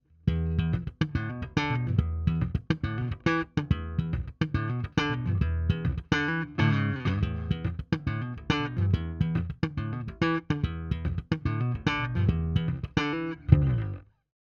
Slap SVT410E sm57

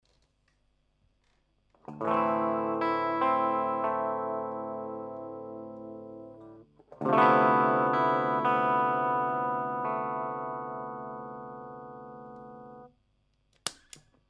bend clean chords

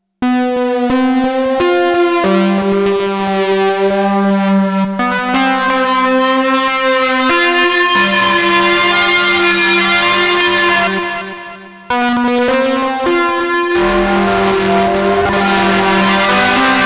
8BIT Wavemem Synth FM Mode FM Ratio sweep; Sonicware ELZ 1

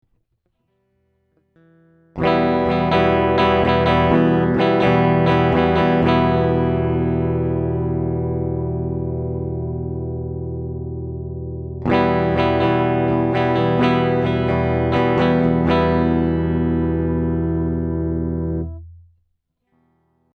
FMR PBC 6A Strum Release Low High

